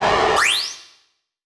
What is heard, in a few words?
Multimedia Internet CD-Rom Flash Pop Up